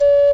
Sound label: Ocarina Note